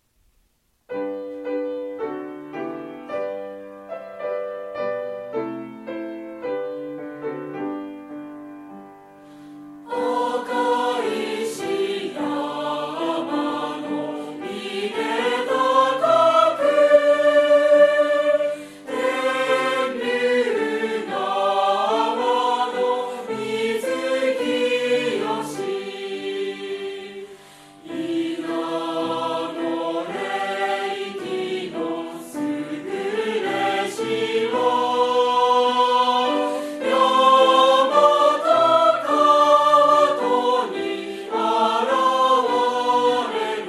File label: piano